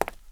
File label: FOOTSTEP CONCRETE; SIMUZENSOUND